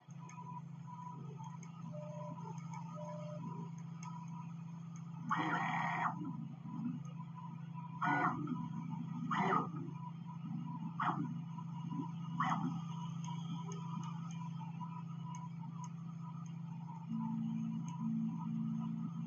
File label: xl noise, Clicking noise while moving tool heads; XL 5TH (with original Prusa enclosure); Prusa3D